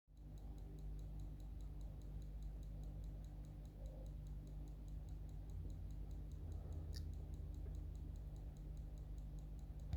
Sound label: Tapping